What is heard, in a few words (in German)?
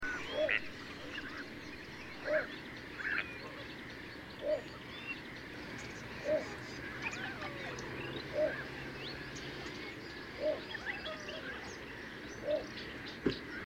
Zwergdommel; 25.05.2009, 21er, Rieselfelder Münster; Jan Ole Kriegs